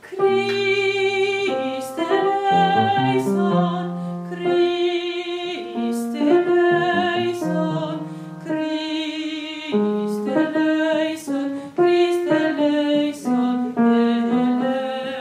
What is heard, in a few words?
alto; christe